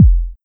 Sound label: Kick Synthie